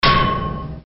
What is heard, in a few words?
hit bar; half life